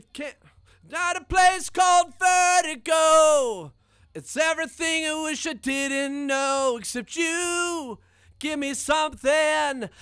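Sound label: vocal; Pro Tools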